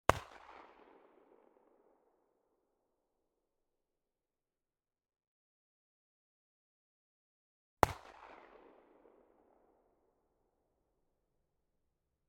SPAS-12 - FIRING - Pump Action - 20m In Front, Franchi SPAS-12 Combat Shotgun; MKH8020; Pole Position